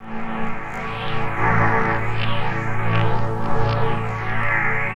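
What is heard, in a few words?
Pad Synth; Equipped Music; Loungin House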